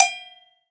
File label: Cowbell; 7rystan's 600+ FREE EDM SAMPLES & DRUMS; Percussion